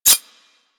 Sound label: Whip Mech Rotate; Grineer